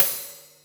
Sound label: Ride, Cymbals